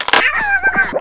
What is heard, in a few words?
fall; quake2; yoshi